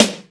prominent acoustic snare sound F sharp key

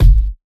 boom steel kick drum G sharp key

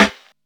warm sounding acoustic snare g key

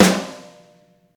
boomy snare f sharp key

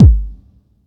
fluffy kickdrum g sharp key

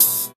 short open hi hat sample f sharp key

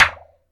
crunchy snare f key; snare drum samples

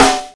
Treble-Heavy Snare Drum D Key, acoustic snare; snare drum samples

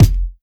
dry bass drum single hit f key; drum single hits one shots; kick drum samples

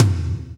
verby tom sample f key; tom drum samples; one shots

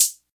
crisp pedal hi-hat a sharp key

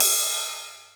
long ride cymbal sound sample G key; cymbal drum

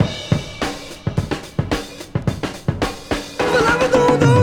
108 bpm drum groove f sharp key

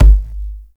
tight kickdrum g sharp key

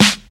90s rap acoustic snare sound g sharp key; snare drum samples

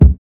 studio steel kick drum sound c key

